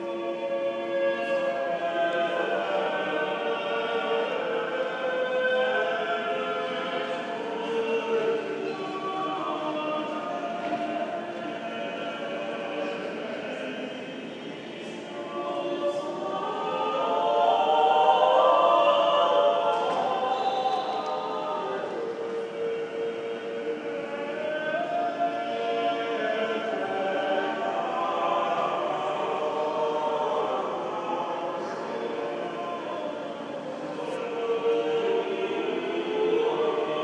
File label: lunchtime gallery singing; kpfest